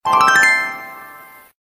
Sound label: succes